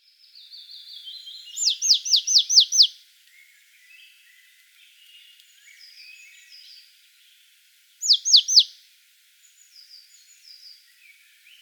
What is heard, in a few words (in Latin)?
Poecile palustris; SETE